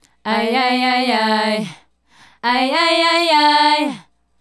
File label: Female Group, Hip-Hop and RnB Vocals